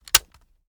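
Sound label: weapon selector on; gmod; foley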